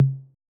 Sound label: tom mid, 808; 808 aristocrat